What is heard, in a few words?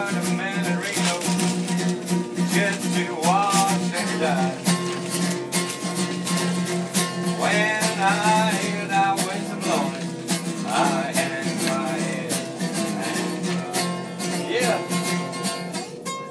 train busker